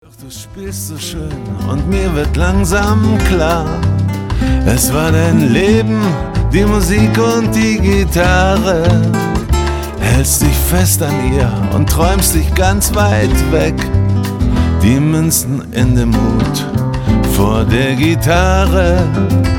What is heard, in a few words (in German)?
du spielst Gitarre